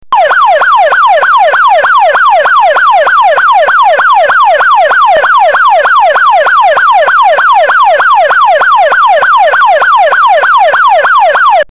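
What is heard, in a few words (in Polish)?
sygnał pies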